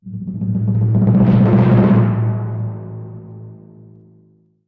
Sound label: Timpani Roll; knight rider